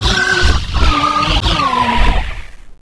door down; ambience; vendetta

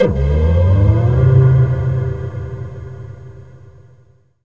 lift start; vendetta

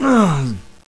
fall pain; player; poke646

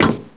metal; blackops

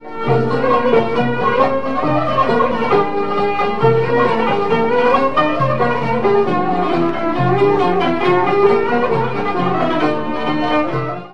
music; vendetta; dahab

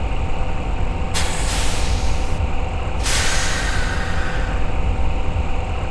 loader, ambience; vendetta

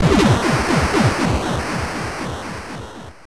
explode

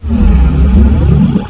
panther alert; custom monsters

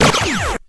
blaster fire; weapons

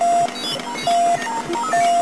ufo on

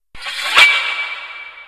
grab dagger; st mission; hs c4

